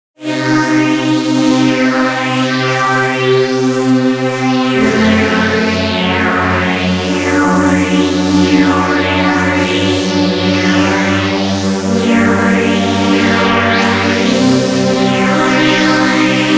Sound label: Sweeper